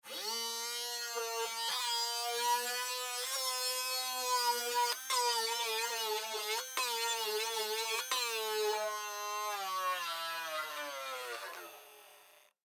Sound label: Dremel Grind, Dremel Grind Soft Object; Grinders; Machines; INDUSTRY; Blastwave